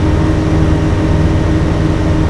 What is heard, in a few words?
tram hum